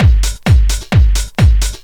techno, DRUMLOOPS

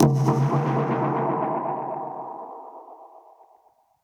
Perc Hit, dub percussion

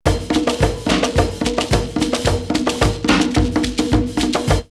drumloops World Ethnic, 108; Freetown; X-Static Goldmine

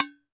TD-20 Tom A, Roland TD-20 Toms

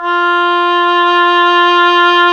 WND OBOE2, Oboe vib; Roland LCDP04 Orchestral Winds; Oboe 2&3

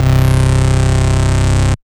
Bass Note C

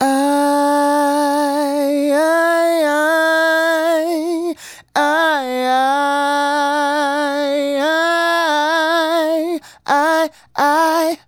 Male Solo, Hip-Hop and RnB Vocals

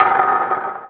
snap, drum machines; 300 Drum Machines; E-MU Orbit-3

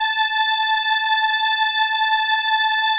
ARP A4, STRENS; Sonic Reality; Vintage Timetraveler

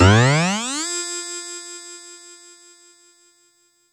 Break Out; Yamaha DTXpress; Effect 1

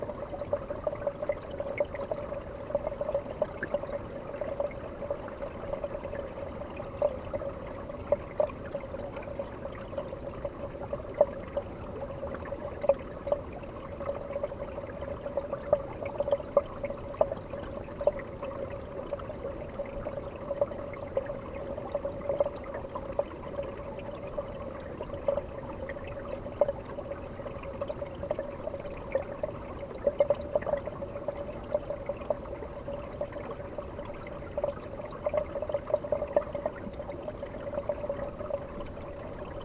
Steady Water Flow; WATR Flow; Steady Water Flow Und

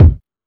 Techno E; EMU XL-7

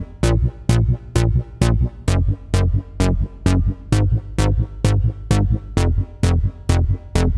NRG Bass, Trance

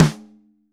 RSD FF, ROCKKIT

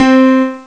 piano acou; Amiga Soundtracker Sample Packs

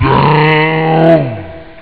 down; Amiga Soundtracker Sample Packs

